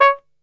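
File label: C#2, flugelhorn, brass; Best Service; Brass Super Section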